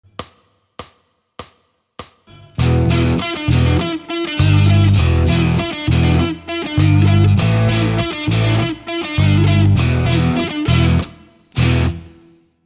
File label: Rock Improvisation